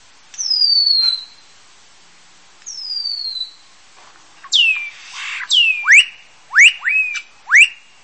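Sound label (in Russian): мелодичное разноголосое щебетание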